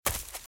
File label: Footsteps - Leaves - Run - Right; RadwinIsland